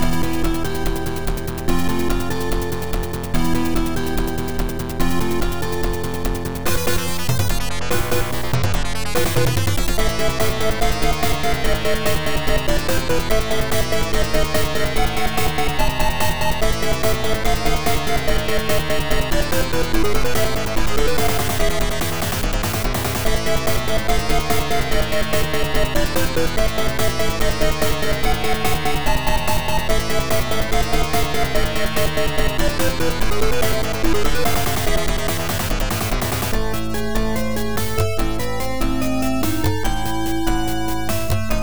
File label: Battle; rance iv kyoudan no isan pc 9801 opn